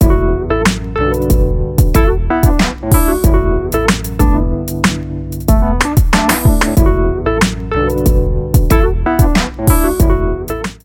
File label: Soul